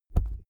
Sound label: wings flap deep; natur-tiere; voegel